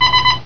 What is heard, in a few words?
timer; MCRAFT